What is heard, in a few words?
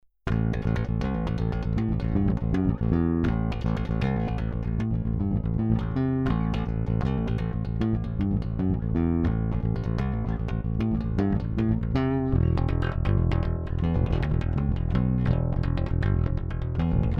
Fender P Bass Rhythmic